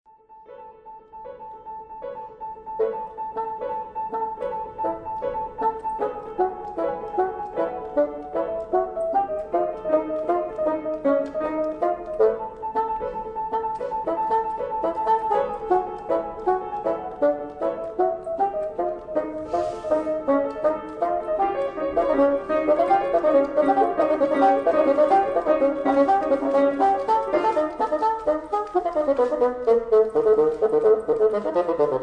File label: bassoon